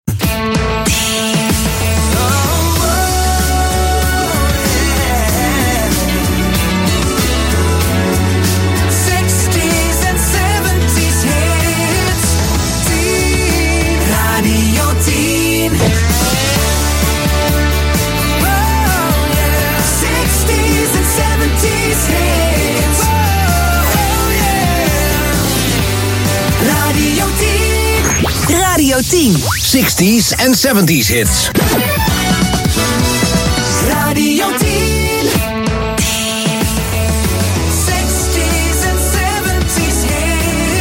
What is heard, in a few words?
60s 70s Hits jingles, gezongen jingles; Radio 10; 2025